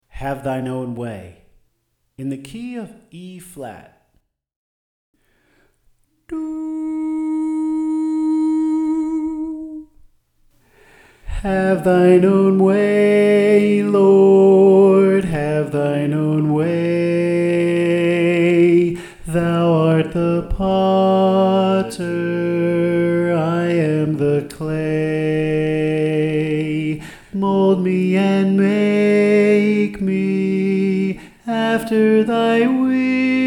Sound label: Soprano